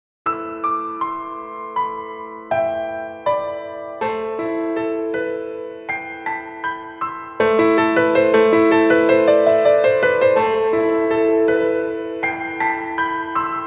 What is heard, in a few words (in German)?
melodische Klavier Solo